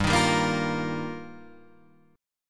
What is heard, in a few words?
G Minor 7th Sharp 5th, Guitar, Standard; Gm7#5; strummed; JGuitar; 3,6,5,3,6,6